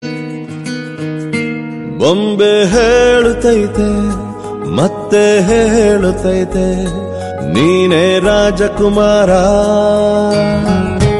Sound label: ringtone